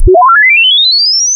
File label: Down Sound